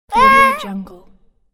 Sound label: kid game fall shout